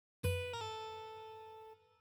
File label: Slides